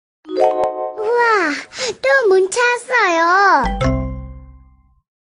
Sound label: kawaii korean tone